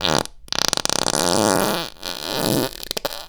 Long Deep Powerful Juicy Crackling Thunderous Explosive Rhythmic Wreckless Forced, Farts; The Chris Alan; 1000 Winds Project; Wrecker